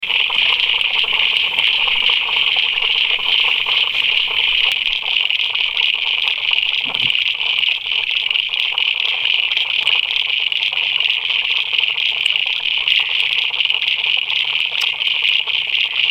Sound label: frogs pier